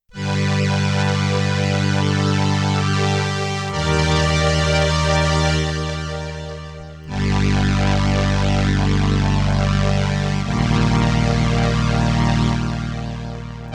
STRINGS I with slow attack, sustain, vibrato and Ensemble I, Roland RS-202